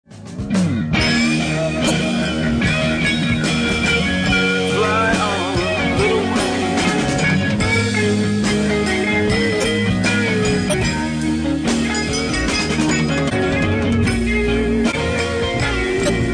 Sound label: solo